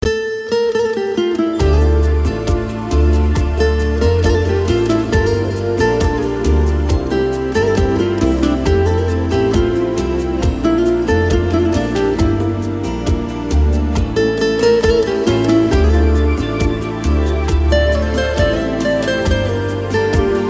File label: sweet romantic guitar